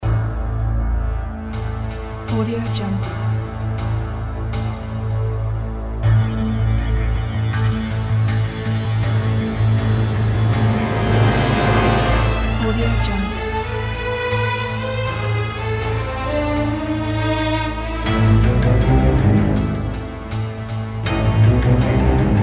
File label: cinematic suspense music